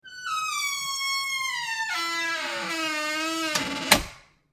Door

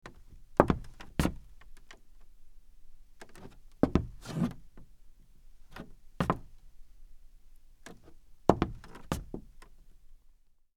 Footsteps Heels Wood Scuff

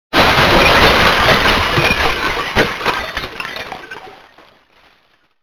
Glass Smashing

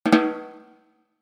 Rimshot bu doo

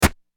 Stab

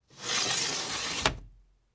Window

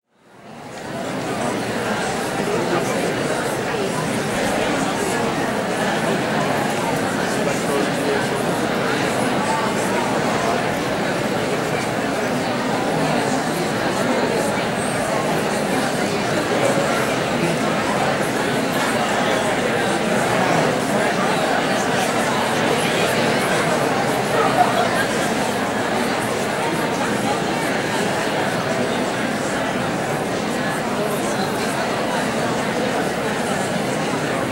Crowd Walla

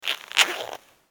Zipper Pants

